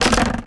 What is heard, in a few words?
board strain; breakables